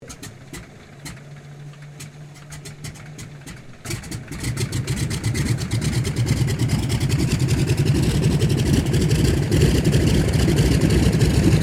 Aircraft CAC CA-25 Winjeel startup taxi, WW2 era Australian training aircraft; Airplanes